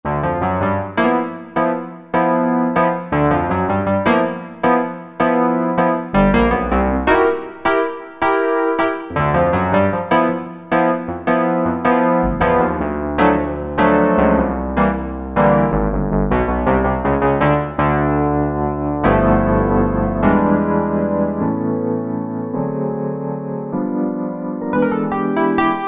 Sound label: Whirly Piano, Roland SR-JV80-03 Piano